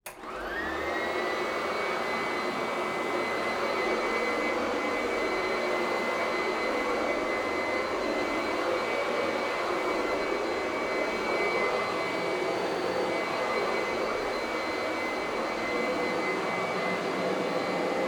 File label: Retro Vacuum Cleaner Activity Carpet Distant; ORTF; Badlands Sound